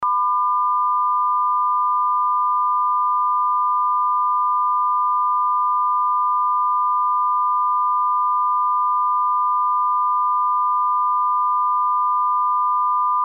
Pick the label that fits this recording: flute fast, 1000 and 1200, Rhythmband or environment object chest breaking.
1000 and 1200